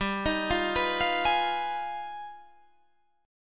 G Augmented 7th Flat 9th

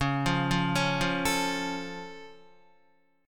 C sharp Augmented down Guitar, chord; C#+ arpeggiated

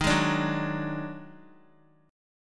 Eb Minor Major 7th Double Flat 5th, down, Guitar; strummed; 4F,4A,5C,5G,5C,6E; chord; JGuitar

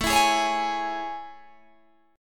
A Major 7th A, Guitar, Open G

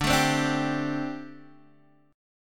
D 7th Suspended 4th, D, down, Guitar Open D

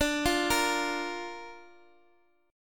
D Minor Sharp 5th D down

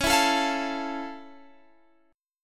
F Augmented Major 7th C sharp

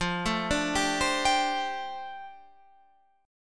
F 6th Add 9th F down, chord; F6add9 arpeggiated

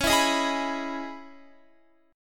C sharp Minor 9th C sharp Guitar; C#m9; strummed; x,16,16,20,20,19

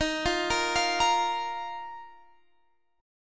D sharp Suspended 2nd, Guitar, Drop D; chord shape

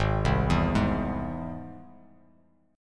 Ab Augmented Ab; Ab+ arpeggiated; JGuitar

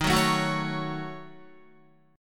Eb Minor 7th Flat 5th Eb down Guitar; Ebm7b5; strummed; JGuitar

